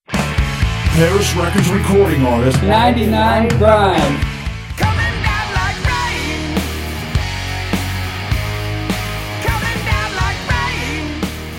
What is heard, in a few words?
music sample